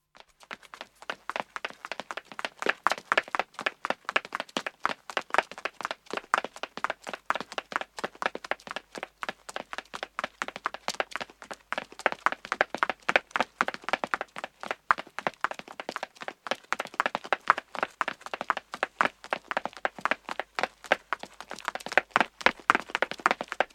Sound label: 1 man 1 woman start and stop, Footstep; Footsteppaverun; BBC